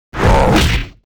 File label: CRAWLER REX attack